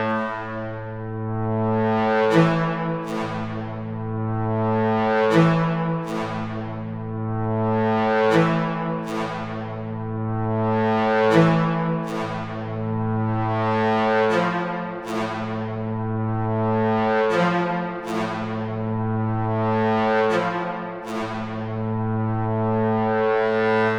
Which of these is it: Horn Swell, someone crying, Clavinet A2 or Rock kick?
Horn Swell